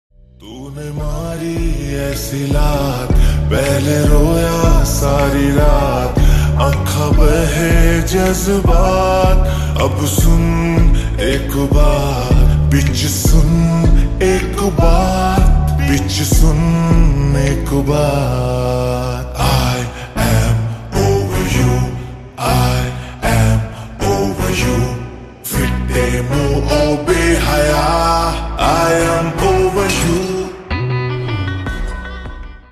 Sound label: Slowed Reverb Ringtone